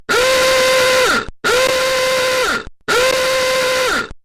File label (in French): vieux tacot